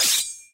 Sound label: reload sfx; kenji; 健次; 传奇